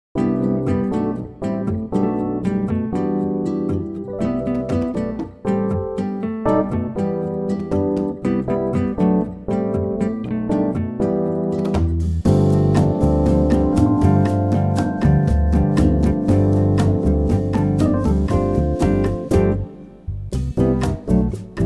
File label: bossa G